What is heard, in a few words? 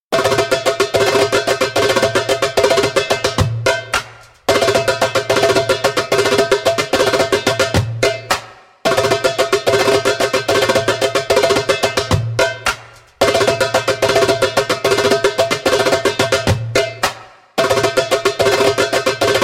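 Rotating Hips (fast) spoken, djembe; Djembolale - Fills and Drills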